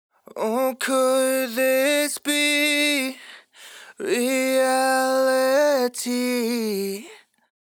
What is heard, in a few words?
Sung Phrase Dry, 128 BPM A Min, vocal; Reality; Cymatics; Euphoria Vocal Sample Pack